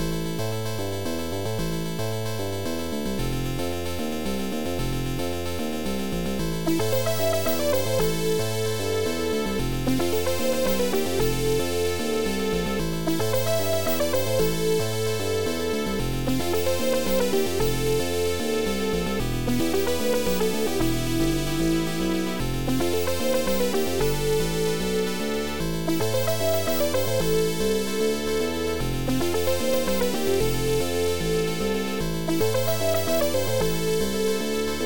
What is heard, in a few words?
funky; ambrstar